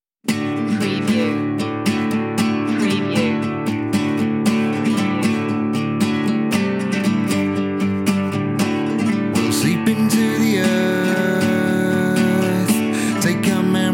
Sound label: outro vocal